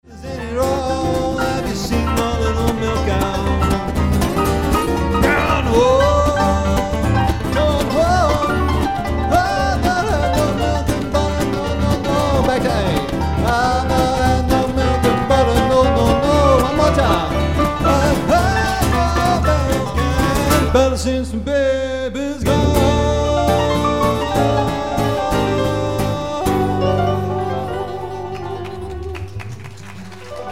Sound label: Blues